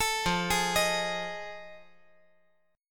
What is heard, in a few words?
F 7th Sharp 9th F